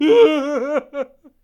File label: Laughs